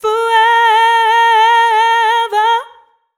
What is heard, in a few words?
f mel e flat, fem Vocals